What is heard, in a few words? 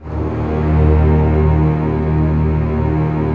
Orchestral f Slow, String Sections; Roland LCDP13